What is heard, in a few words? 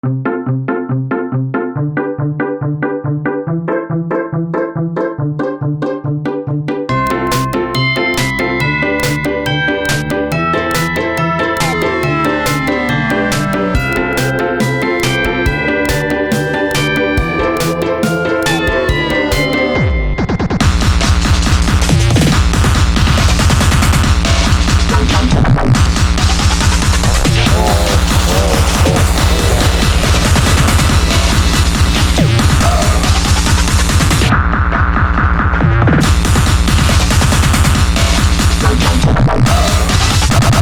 Xmas Rave